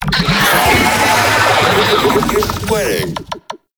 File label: Idle, Vocals; Warframe; Proto Infested; Babau